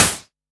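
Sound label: Analog SY1 Snare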